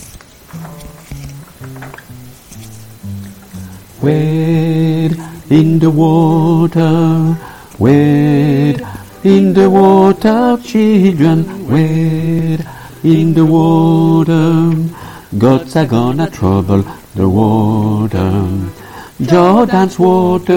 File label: Wade alto, chorale